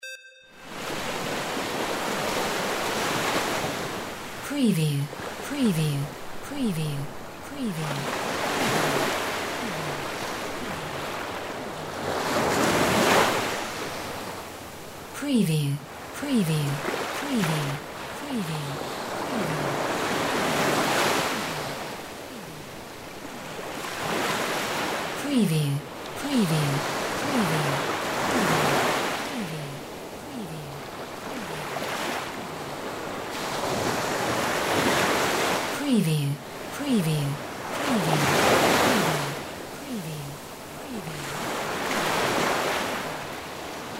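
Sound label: WATER SEASHORE WAVES; windy beach audio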